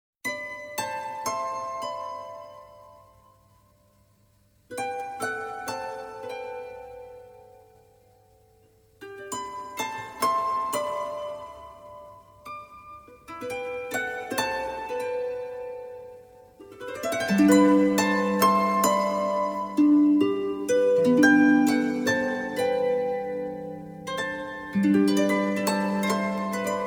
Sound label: harp